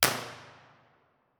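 middle tunnel 1 way mono, impulse response, Innocent Railway tunnel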